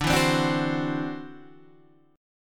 E 7th Suspended 2nd Sharp 5th D, chord

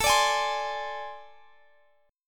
A Minor Major 7th Double Flat 5th A; AmM7bb5; strummed; chordshape; x,x,19,19,21,20

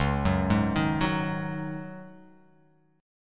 C sharp Suspended 2nd Suspended 4th, C sharp

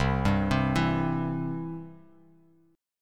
C Major, C, down, chord; arpeggiated; JGuitar

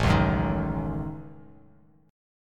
A 6th Flat 5th, Bass Standard; A6b5; strummed; JGuitar

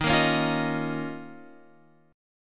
D sharp 7th Suspended 2nd, Guitar, Open F; D#7sus2 strummed; JGuitar

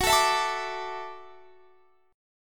Gb Minor 7th Sharp 5th Gb, guitar

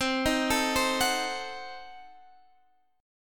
C Diminished 7th, chord; arpeggiated; Cdim7; x,15,13,14,13,14

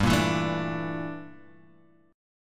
G Minor 6th Add 9th, Standard; Gm6add9 strummed; JGuitar

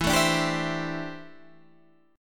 D 7th Suspended 2nd Suspended 4th E

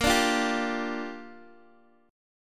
Bb 6th, Guitar, Open D

